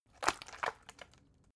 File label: bottle water big shake single soft; berufe